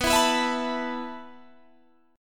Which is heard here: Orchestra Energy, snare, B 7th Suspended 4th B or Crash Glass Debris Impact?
B 7th Suspended 4th B